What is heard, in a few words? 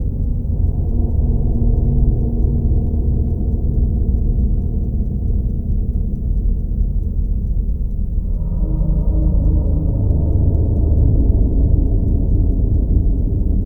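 Level Load loop; silent hill homecoming; ps3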